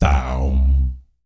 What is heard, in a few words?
BS, Vocal BASS FX